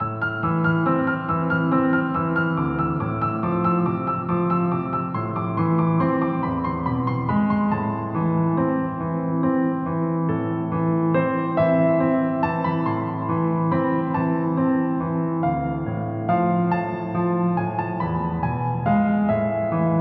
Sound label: piano